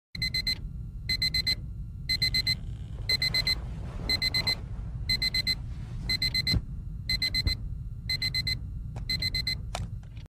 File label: Alarm-Clock Sound